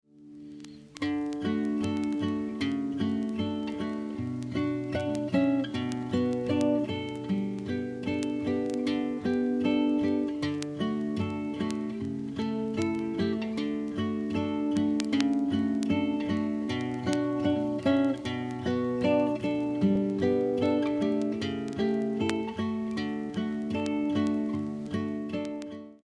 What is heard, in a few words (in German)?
Die Spieluhr